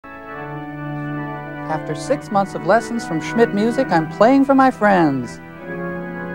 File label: organ